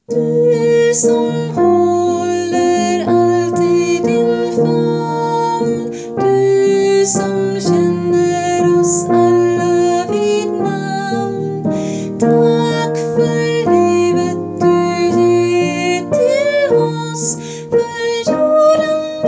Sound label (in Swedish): Tack tenor